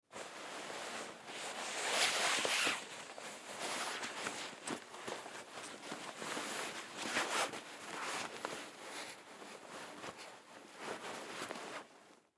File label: Hockey Pants Off, Hockey Pants Take Off; Sports